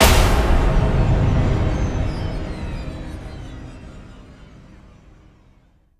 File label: human death stinger; zombiesurvival